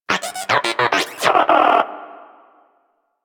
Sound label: Moa Inquisitive Vocal Battle Ends, Pets; Warframe